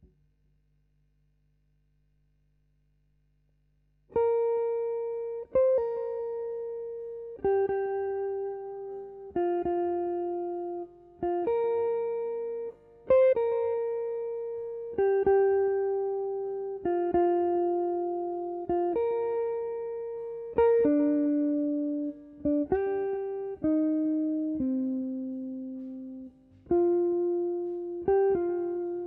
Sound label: GTR B